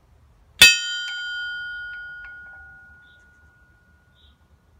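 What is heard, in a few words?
hitting a rail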